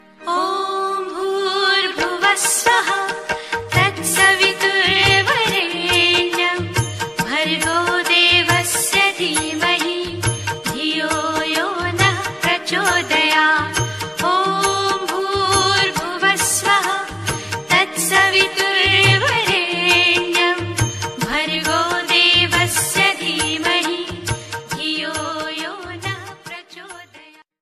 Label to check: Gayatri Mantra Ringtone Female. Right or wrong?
Right